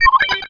BEEPS

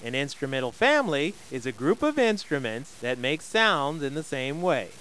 instrum

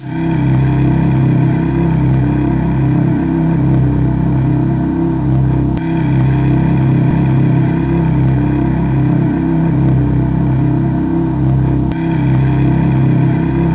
dramatic bass long

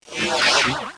OrbIn; Jurassic Park

